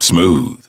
bigband

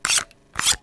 push

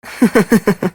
giggle; joshua

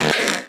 nibble; geiru

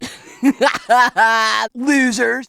laugh losers

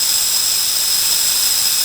rail glind; sonic

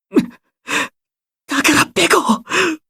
crying

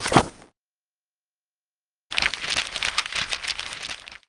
book open page; susato

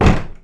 light bang; trilogy

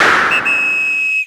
bang whistle

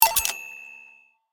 glass serve

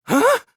huh; gonta

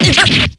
damage

